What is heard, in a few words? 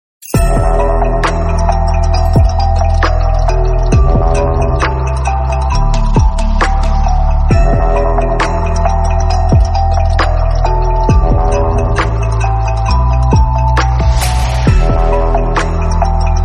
music healing round